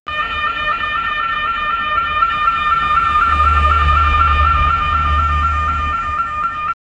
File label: soft ambulance